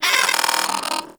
Vocal Pain; Hyena Micro

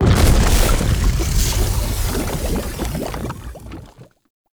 Lava Burst; Ambience; Props; Warframe; Tau; Circles Of Hell

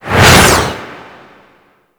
Air Rush Slow One Off; Warframe; Archwing

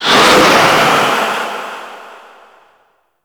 Sandstorm Gust Enemy Pickup; Warframe; Mummy

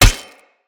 Hammer Impact Flesh; Weapon Impacts; Tuvalkane Hammer; Combat

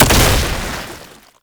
Wall Climb Death Explosion; Warframe; Oraxia

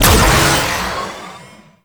Bounce Launch; Warframe; Trapper

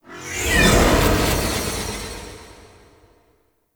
Sculpture Transition; Warframe; The War Within; Mountain Pass; Oro

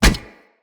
Run Metal, Footsteps; Kubrow

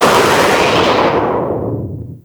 Berserker Grapple Travel; Warframe

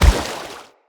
Step Water, Animals, Foley, Steps; Bromius

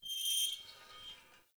Metal Screech Small; Warframe; Tau; Ambience

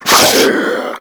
Infested Diseased Ancient Breath

